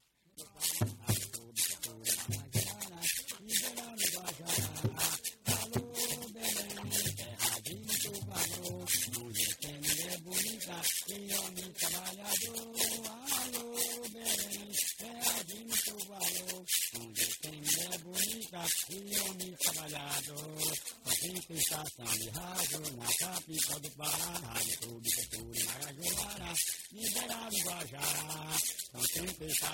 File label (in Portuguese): carimbo; sao benedito